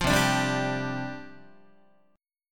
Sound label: C Suspended 2nd Suspended 4th, down, Guitar